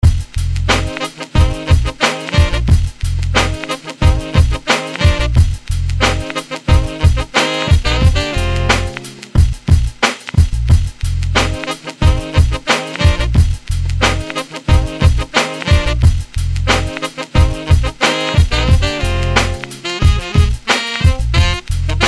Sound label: Tripple Sax